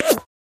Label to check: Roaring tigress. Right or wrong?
Wrong